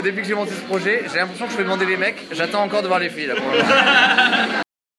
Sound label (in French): RIRE